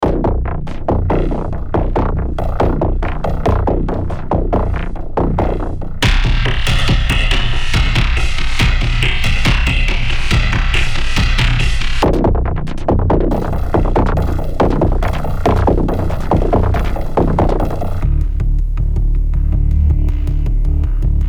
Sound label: low pulses